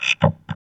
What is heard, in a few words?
VOX VOX, Electro Vox